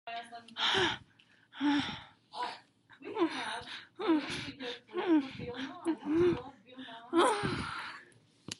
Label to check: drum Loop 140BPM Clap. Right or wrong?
Wrong